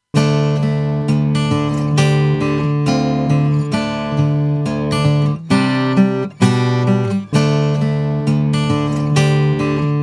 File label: EQ4M Guitar